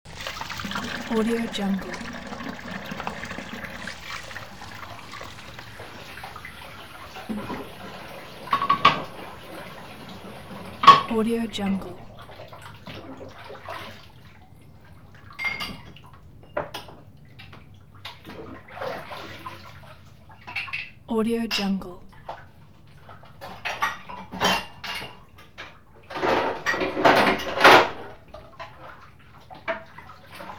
water running with dish movements

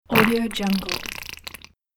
cartoon squelch

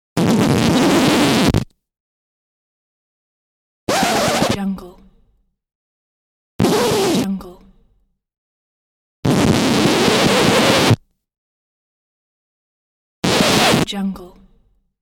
skipping record needle

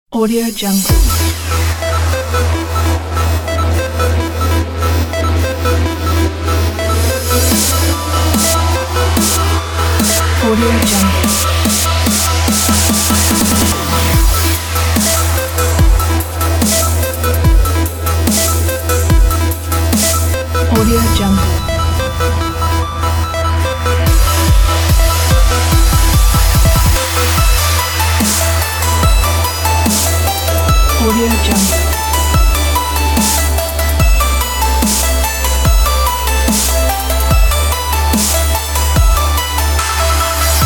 future dubstep

